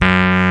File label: Rhodes F#3; Vintage Instrmt; E-MU Formula 4000 Series Vol. 5; Protozoa